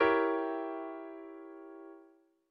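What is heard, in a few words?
E diminished chord; ackordtabell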